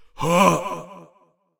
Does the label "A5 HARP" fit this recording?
No